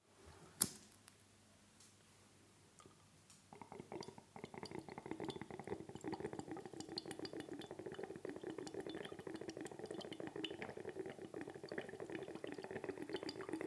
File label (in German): Wasserpfeife